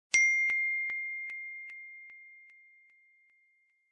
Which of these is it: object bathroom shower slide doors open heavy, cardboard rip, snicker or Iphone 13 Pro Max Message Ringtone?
Iphone 13 Pro Max Message Ringtone